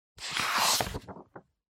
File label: Magazine Flip